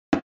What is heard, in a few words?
SNES Soccer Pass